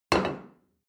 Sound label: plate place table medium; sport-freizeit; restaurant-cafe